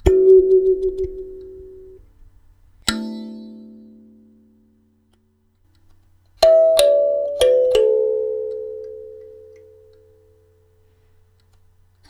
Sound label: kalimba wellness